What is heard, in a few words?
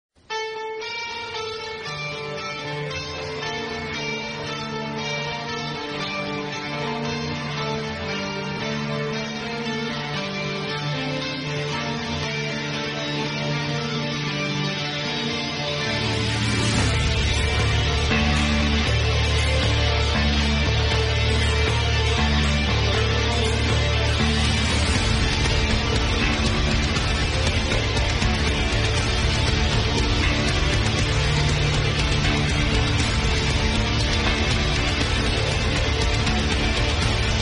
theme remix